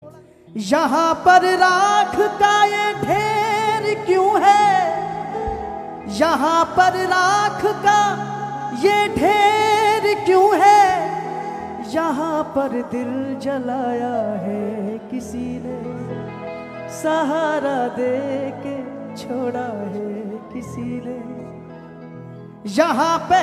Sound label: Ringtone